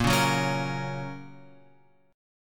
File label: A sharp Minor Sharp 5th; A#m#5 Chord {6 4 4 6 X 6} strummed